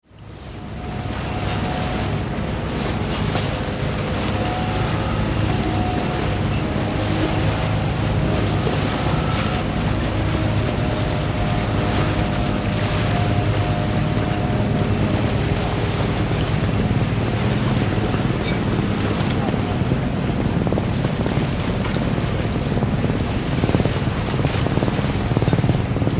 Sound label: ambience harbour mouth boats; Harbour Mouth Ambience - Motorized And Sailing Boats Leaving Harbour; Outdoors; McKinneySound